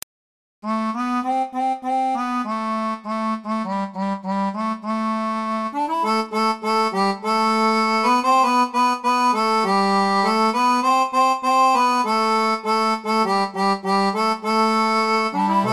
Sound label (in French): seraphone, orgue barbarie